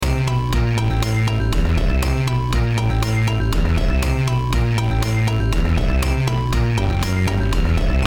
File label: background music